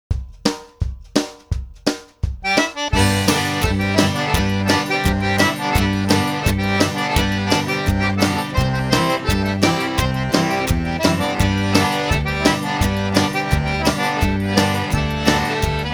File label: all the parts with accompaniment; Back Door